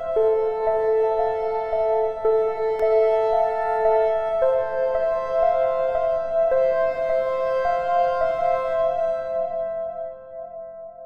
piano, dark ambient

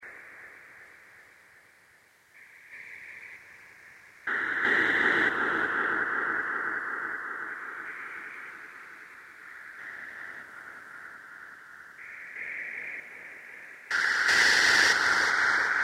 noise, dark ambient

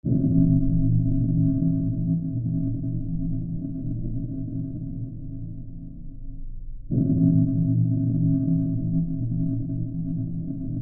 dark ambient

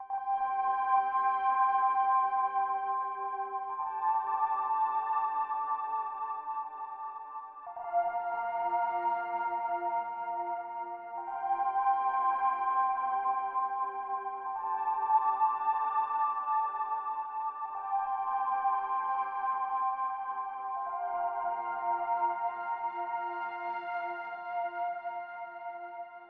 keys, ambient

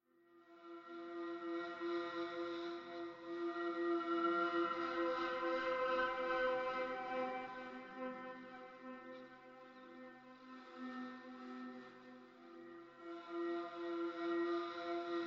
pad, ambient

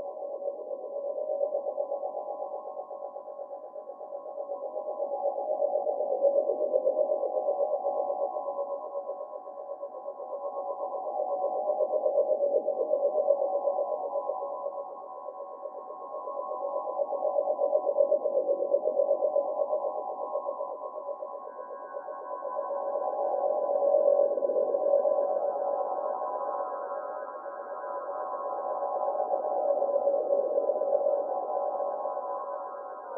ambience, dark ambient

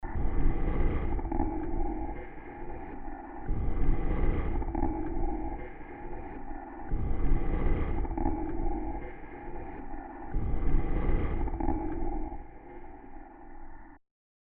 breath, dark ambient

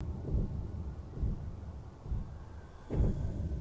ambience, dark ambient

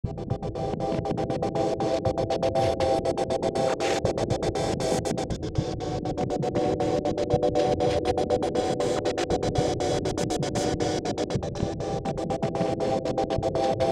sequence, ambient

